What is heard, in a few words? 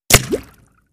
Bullet Impacts Water